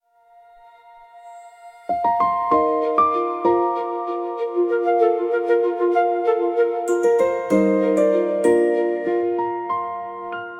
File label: bright welcoming; guest intro